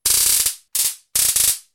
taser electricity crackles, Recorded my stun gun in a small apartment studio, crackling few times.